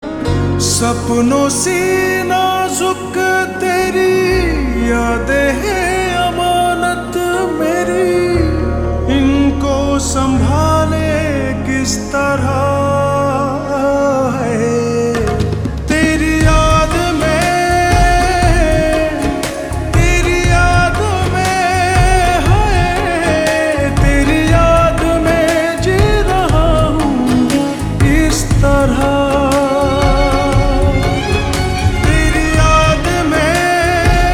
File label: Ringtone Slowed Reverb